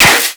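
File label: bullet impact paint metal; Modern Warfare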